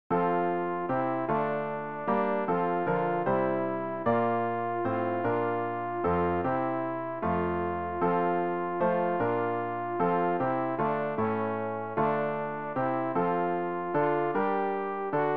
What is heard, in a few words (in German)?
alt, Übehilfen für das Erlernen von Liedern; vor den tueren deiner welt